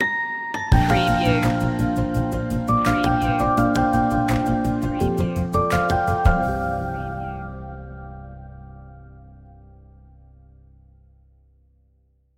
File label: you know it stinger